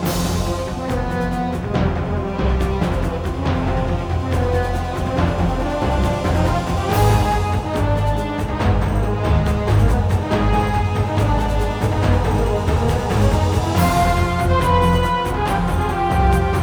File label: Combat; Battlegrounds